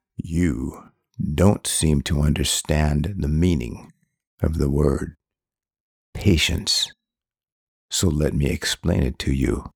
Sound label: Baritone